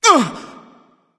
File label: surprise; smite; zeus